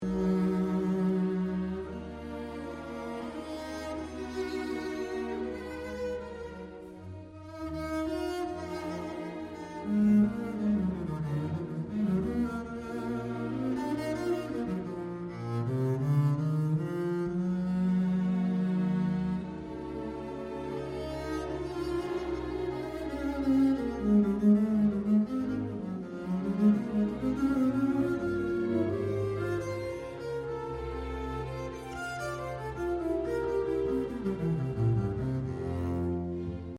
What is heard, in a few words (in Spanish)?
contrabajo; castellana; infants; instrumentos